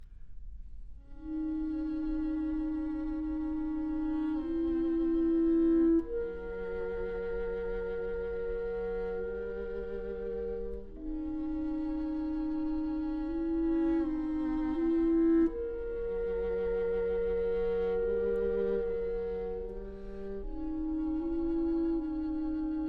Trio for Clarinet, Viola, and Piano - Adagio molto; Bruch Trio